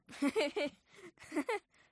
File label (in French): Herisson Rire; mirumiru